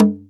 Caj Sl; EMU Orbit-3

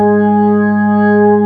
B3 G#2; Best Service; Advanced Media Trax Modern Composer; B3 SMALL2

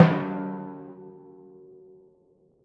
F#2 f, Timpani, forte; PowerFX; Downtown Orchestra; scales

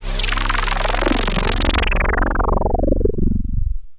Juno Bubble

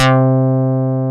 SYN CLAV; DANCE

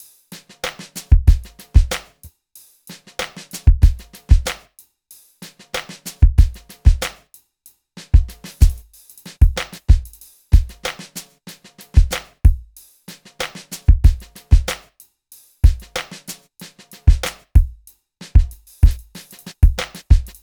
Drums, Hip Hop

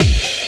COMBI, Houseworx; Ueberschall; Workz-9

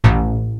SP-12 KIT; Rubber Chicken Software Sample Bank

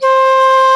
SHAKU, WOODWINDS; Roland - LA Composer vol. 1

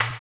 HAMBONE, Vocal Blues Soul; Spectrasonics; Planet

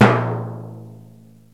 TIMPANY; Crypton - DrumBase5000

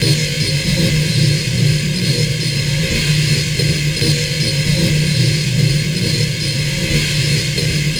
Lava Plain, Environments; Sonic Foundry (Sony Creative Software); Myths of Technology

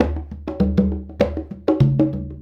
Congas; Essential Percussion

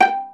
PIZZ VIOLAS; E-MU Formula 4000 Series Vol. 5; Protozoa; Proteus2 Instrmt

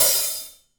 Hi-Hat Open Stereo; Sonic Foundry (Sony Creative Software); Vital Drums

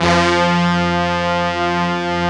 Brass F1; Brass98

